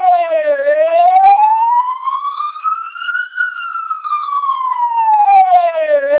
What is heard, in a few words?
twelp 1600 siren